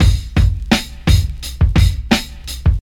113 bpm drum loop sample f sharp key

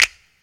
clap d sharp key, Hand Clap; percussion; single hits one shots

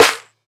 hand clap a key; percussion; single hits one shots

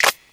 sharp clap sample c key; snare drum alternatives

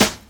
bright acoustic snare sound C sharp key

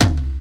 90s original reggae tome drum sample c sharp key

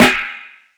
verby snare sound b key; Duration 0:01; Samplerate 44100 Hz; snare drum samples

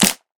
clean hand clap sound g key; single hits one shots

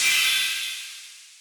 long room reverb single cymbal hit D key

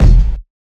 fluffy kick drum sound e key

